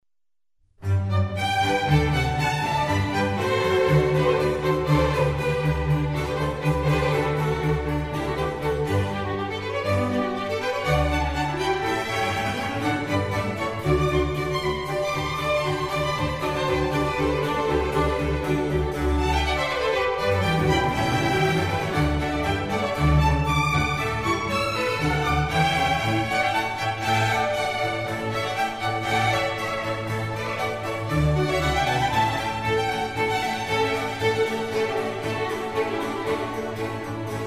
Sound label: Concerto for Strings in G major; RV145; Antonio Vivaldi